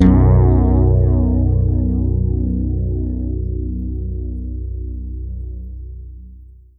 MAJ DOBL B, PEDULLA BASS; Masterbits CLIMAX 7 - Bass

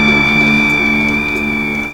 SCREEN NOIZE, Synth FX Misc

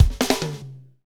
FUNK; Northstar - Drumscapes Roland

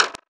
Puk, One Shots Hit; Sonic Foundry (Sony Creative Software) - Future Retro Dance Excursions

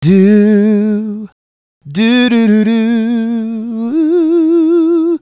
Male Solo, Vocal

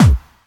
KIT, Dance Mega Drums; Best Service

